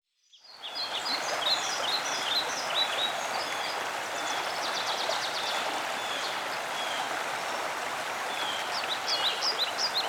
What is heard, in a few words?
forest birds and water nature; wellness interlude mix